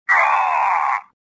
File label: choke; Star Wars Jedi Knight II Jedi Outcast; XBOX; shadow